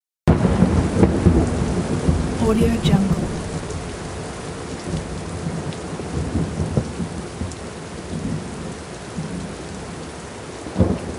intense thunder rumbling in the middle of rainy day